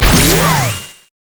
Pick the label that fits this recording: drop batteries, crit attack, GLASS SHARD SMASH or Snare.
crit attack